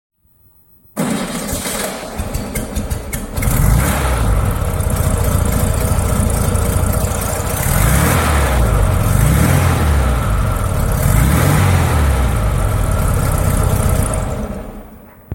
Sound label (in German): VW Kaefer